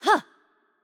Attack Light; bloom minigame

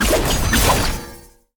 Attack Dash Heavy; bloom minigame

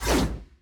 Attack Light; bloom minigame

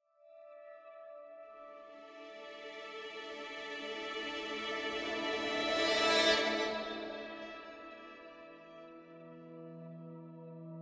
Music Lab Outro

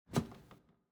Footstep Wood Stairs

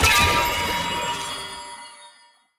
Item Spirit; bloom minigame

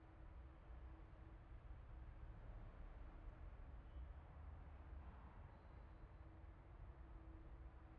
Ambience Arcade Main

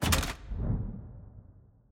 Component Put Down

